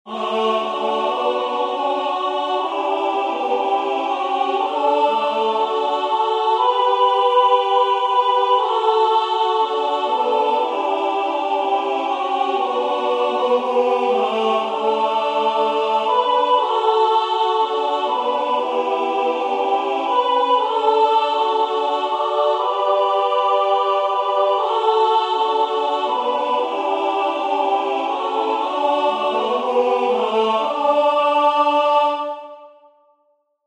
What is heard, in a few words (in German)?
Oberstimme